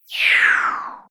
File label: Breathy down